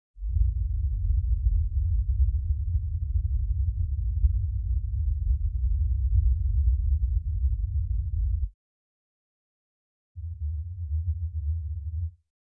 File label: 50hz, 63hz, 80hz -20／-16db L／R [l 1／3oct． Band Noise, R 1／1oct． Band Noise]; Denon Audio Technical CD